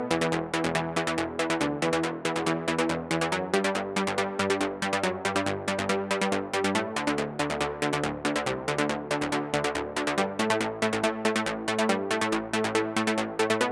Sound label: Bassline High 140BPM, Eminor; Vengeance Samples